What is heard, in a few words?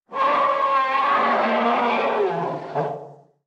universal monster roar